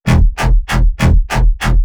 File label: Massive Comlextro, bass